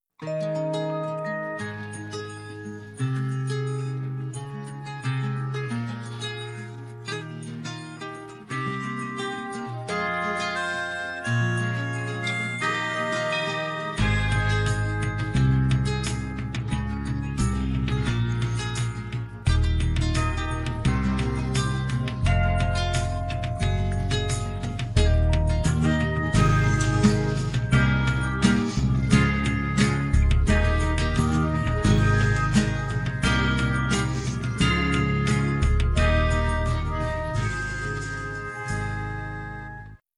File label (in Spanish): Music comercial Navidad music; Aguila Roja